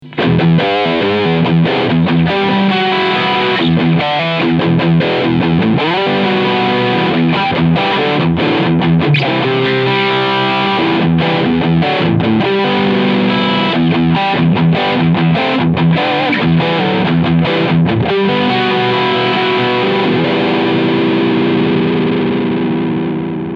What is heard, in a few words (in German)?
Strawberry Red DLX Full Gain EQ 12 Uhr Presence FULL; One Control